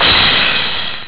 CYMBAL; MUSIC

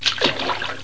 SPLASH

